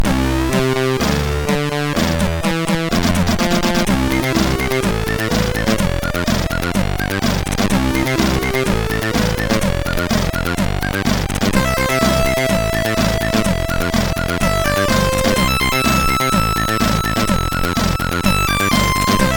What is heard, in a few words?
Cool Drum, sid MOS6581R3